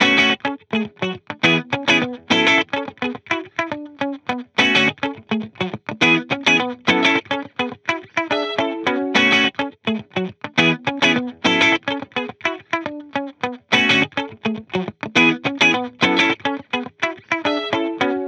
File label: guitar loop 105 F#m; Pop; Guitar Stacks; SD PASADENA Pop Sample Pack; Slate